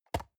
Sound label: storage place table soft, box; objekte